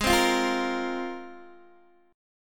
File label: G sharp 6th Add 9th, Guitar, Open D